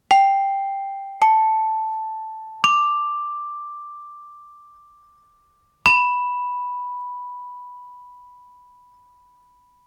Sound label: steel pan music